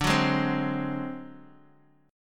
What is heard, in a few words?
D 7th Flat 5th D down Guitar Open D